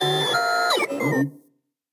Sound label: flying robot; factorio 2.0.55